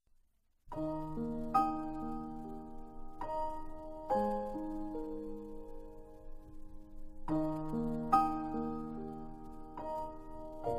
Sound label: Instrumental